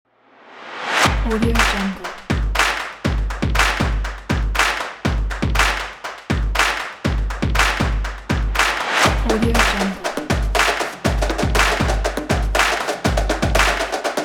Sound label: the sport intro